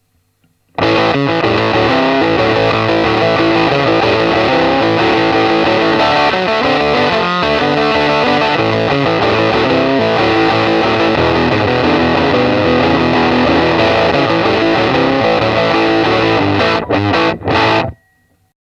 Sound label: Lead